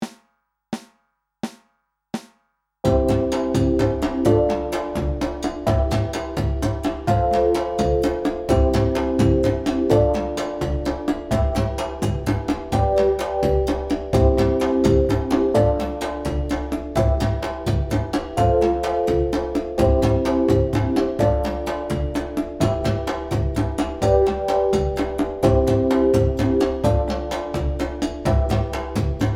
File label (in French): groove triolet croche 85bpm; fais groover sens rythmique; exercice deb groove jouer plaisir triolet croche 85bpm